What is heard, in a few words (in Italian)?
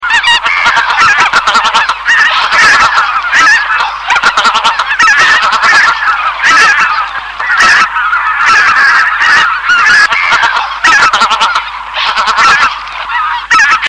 Oca lombardella maggiore